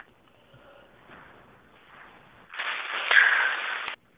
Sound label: EVP